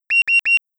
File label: warning repeat; nopenpilot; selfdrive